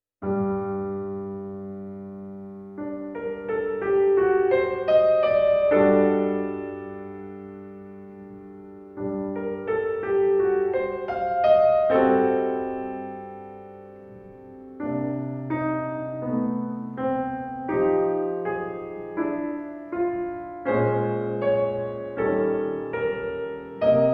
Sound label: Piano; The Wind Rises